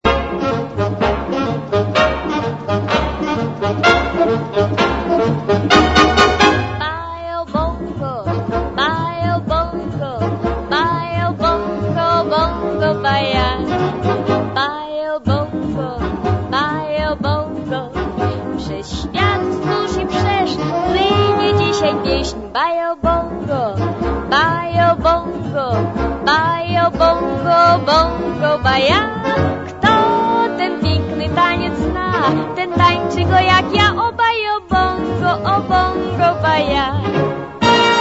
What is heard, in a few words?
baiao bongo